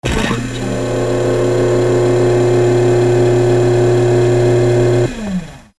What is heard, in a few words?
hydraulic pump dentist chair; hollywood edge